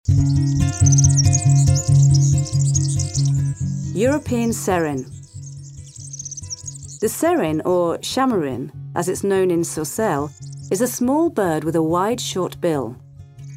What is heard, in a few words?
serin, birds; lavanderas